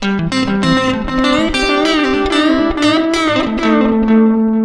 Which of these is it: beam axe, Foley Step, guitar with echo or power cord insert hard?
guitar with echo